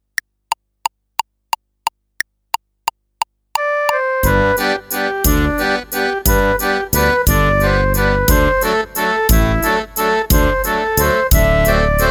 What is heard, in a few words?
Irish Washerwoman slow, fife